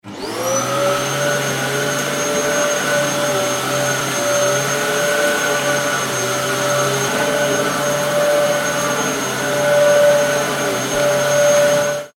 household vacuum bagless operating; Vacuum Cleaner ( Bagless Dyson ) Switches On And Operates - Includes Room Reverb ( Medium; Cleaning; McKinney Sound

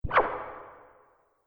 Multimedia Rollover, mouseover sound; Andrew Fleming

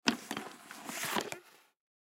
Bowling Ball Bag Ball Put In; Bowling (Sound Effects:Sports); Blastwave

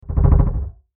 Chopper Dark Bass Knocks; Blastwave; Chopper Sound Effects

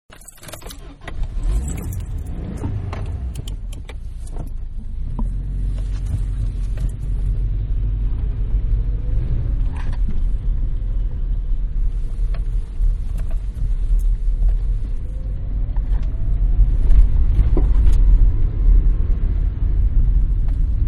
Mid Size Car Start Up Drive Away-Interior; Manhattan Production Music

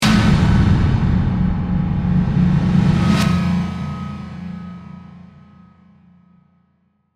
Hit To Whoosh, Reverse, Suction, Delay; Production, Element, Imaging, Accent, Transition; Hits; BlastWave

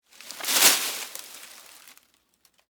Foliage Drop Move, Palm, Frond

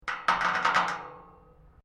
GATE METAL LARGE CREAK, Large metal gate; Gates; Doors

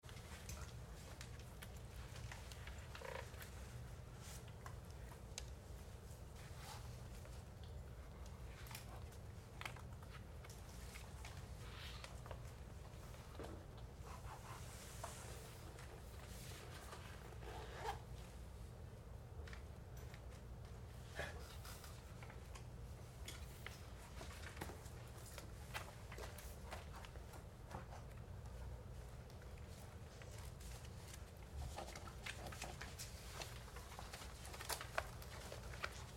Activity Class Listening to Teacher, school; Schools (Sound Effects:Backgrounds); Big Room Sound

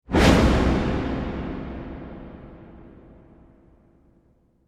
Production Element Whoosh Whip Fast; Whips; Sound Design

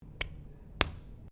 GUN TOY DART STICK POP

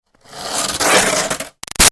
Snow Scrape Shovel By Gritty; Yard Tools; BigRoomSound

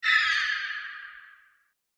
Space Bird Call Whoosh

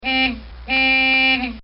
Jeep Horn; Car Sound Effects; Vehicles; recorded in a custom built sound booth

